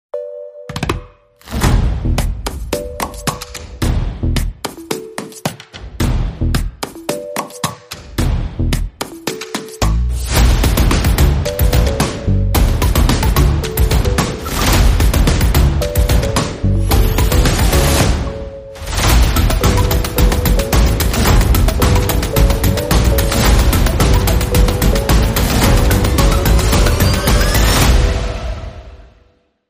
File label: Snap it Clap it Crack it